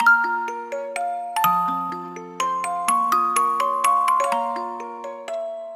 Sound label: music box; Identity V